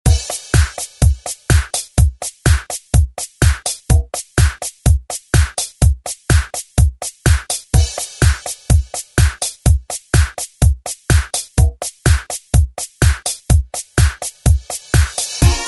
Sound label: 90s ReDrum; DJ Mhark